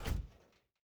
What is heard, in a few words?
Aircrafts MiG-15bis Canopy Open End; DCS